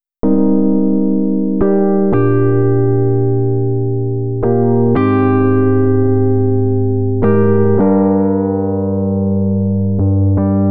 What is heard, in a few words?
EP, Electro Lounge; Sonic Foundry (Sony Creative Software); 086 Huggybear